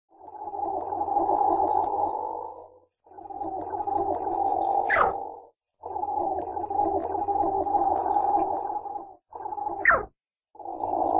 utkonos, zvuk utkonosa

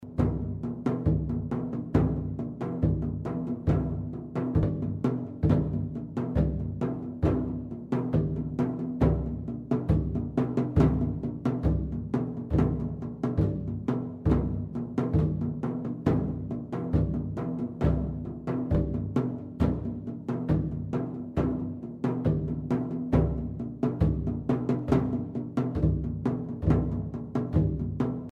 indeiskie barabany

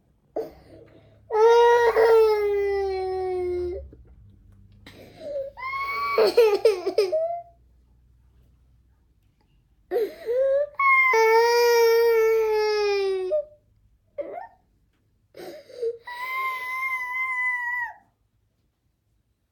spok plach dev, zvuk detskiy plach

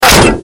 cs go popadanie puli v shlem; Попадание пули в шлем

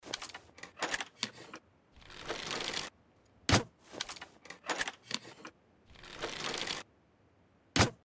zvuk arbaleta

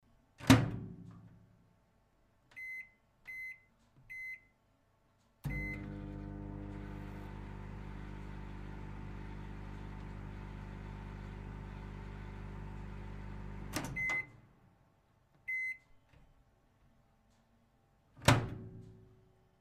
tih zvuk mikrov

